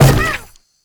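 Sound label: Launch Shroom Projectile Bounce; Warframe; Nokko; Launch Mushroom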